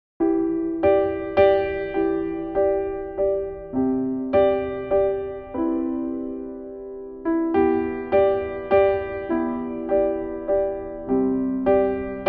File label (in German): Walzer; samstag morgen